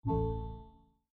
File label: Balloon; Quirky